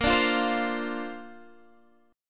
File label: A sharp Major Add 9th, Guitar Standard; strummed